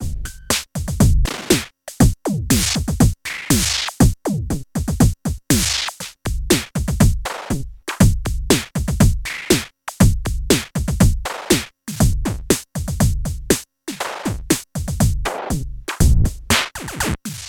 Transient Plus RING Mod by OSC1 CV Start Sample Variation; Future Retro